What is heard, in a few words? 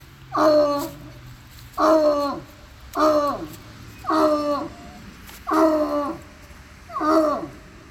Bloodhound bark